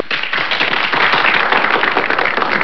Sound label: applause